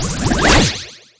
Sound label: Enemy Ufo Teleport